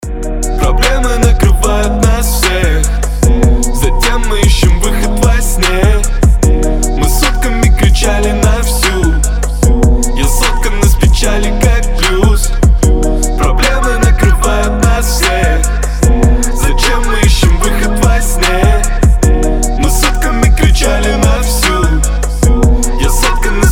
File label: blues